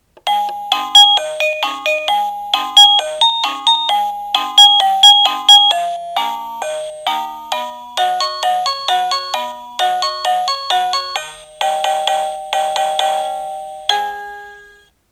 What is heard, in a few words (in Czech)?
melodie